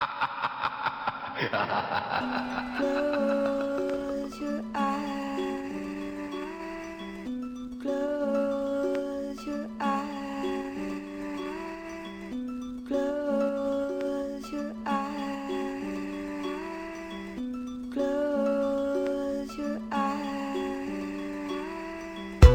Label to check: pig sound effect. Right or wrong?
Wrong